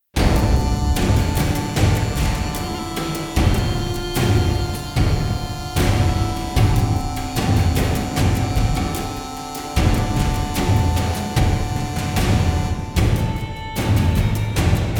Combat; The Witcher 3 Wild Hunt; Skellige

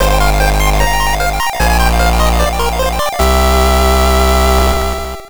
VS Win; After Burst; GB

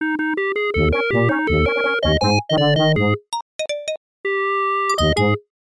Game Clear; The Adventures of Rocky and Bullwinkle and Friends; Genesis

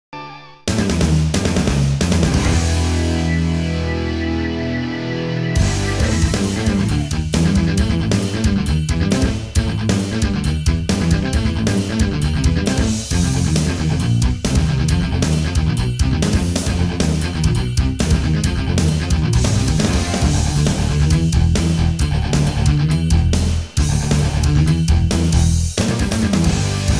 Intro Music; Motocross Madness

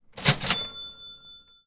Sell Stuff; This Is the Police 2

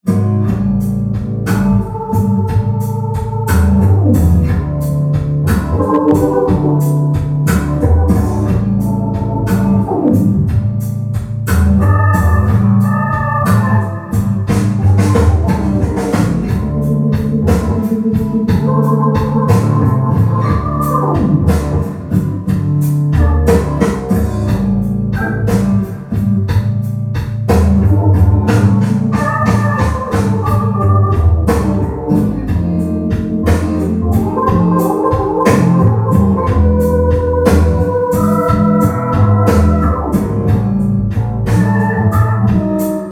Jazz, Mono

